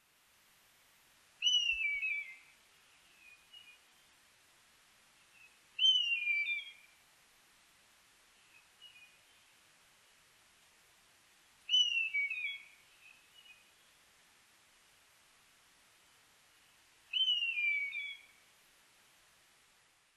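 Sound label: nosuri, buzzard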